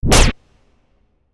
Sci Fi Laser; SoundsCrate

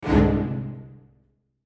low strings chord

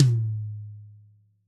tom hi; soundscrate

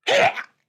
death grunt; SoundsCrate

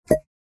suction; soundscrate

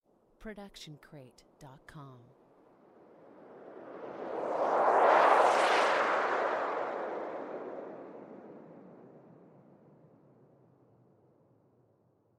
plane fly by; soundscrate

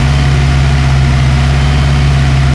engine loop; soundscrate

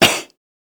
cough; soundscrate

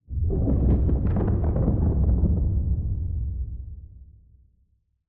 Thunder Crack; soundscrate

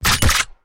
Shotgun Reload; soundscrate

